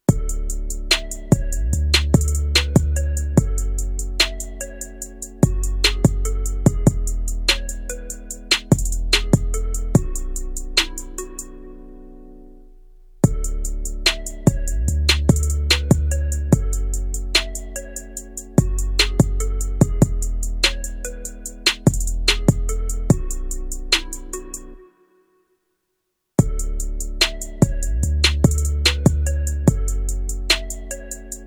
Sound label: instrumental